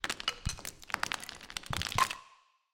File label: the sound of falling pebbles; zvuk kamnya